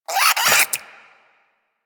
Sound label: Vocal Attack Short, Hunting Critters; Warframe; Cryptilex